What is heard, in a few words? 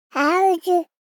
BABIES TALK Boy 12 months How Do; Baby Boom